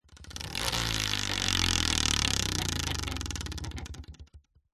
leisure wheel of fortune wooden short spin; zapsplat

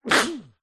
human male man sneeze into cloth or arm muffled; zapsplat

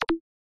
os battery low in call; omar alvarado

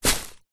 footstep single long grass; foley; zapsplat

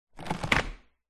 fridge door large metal open

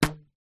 slingshot release

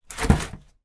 industrial crate plastic drinks movement

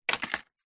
leaf dry drop fall on others; zapsplat

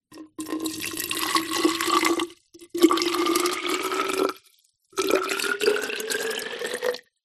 food drink water pour into plastic flask

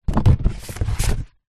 foley bottle wine place into paper gift bag

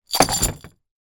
broken glass jar throw into plastic trashcan; foley; zapsplat

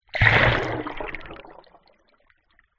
nature water underwater small splash hydrophone; zapsplat

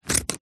foley scissors cut cotton underpants single cut; zapsplat

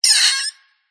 squeaky metal Designed Alien Bird; PMSFX

